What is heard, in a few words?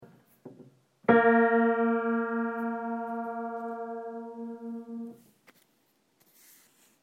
Kawai pitch raise A3